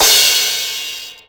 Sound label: Crash, Roland MV8800; LM-8800